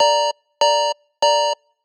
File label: alarm clock, alarms; OnePlus; OnePlus 5